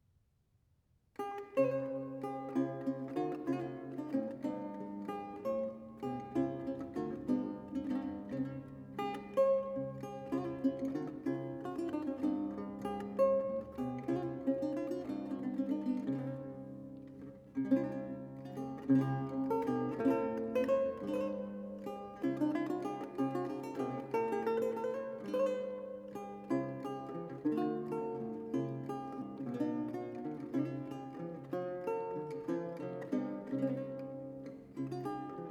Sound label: Lute Solo; GREENSLEEVES; Wilson's Wild